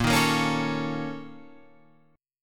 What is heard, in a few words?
A sharp Minor Major 7th Double Flat 5th A sharp